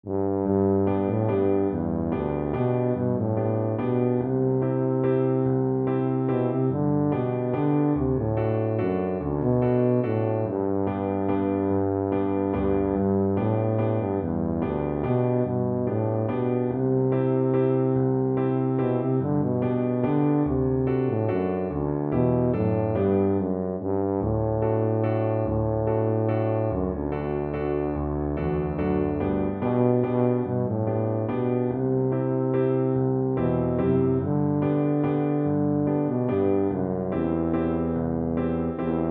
wild rover, tuba

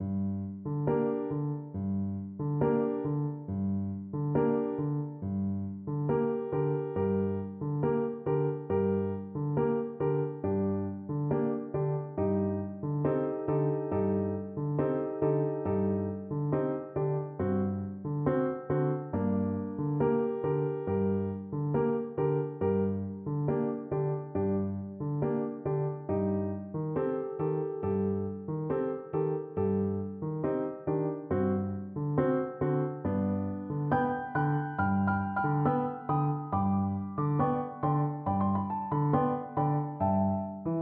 Oboe; bizet habanera

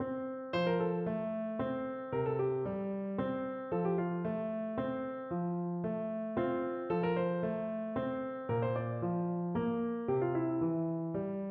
paisiello el cor piu, French Horn

